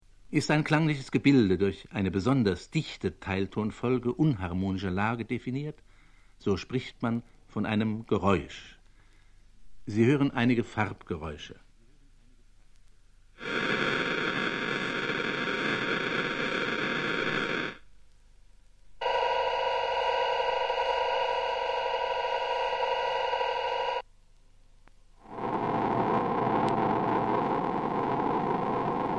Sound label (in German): geraeusch; eimert